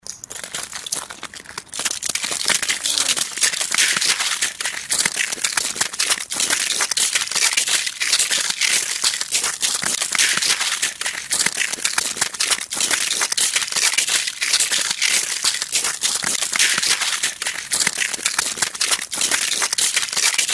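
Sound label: sound of crunching ice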